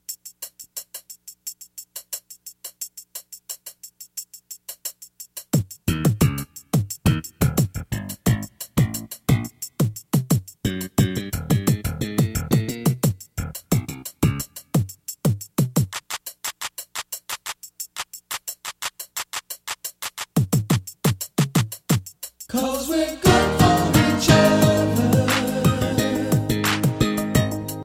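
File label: good for each other instrumental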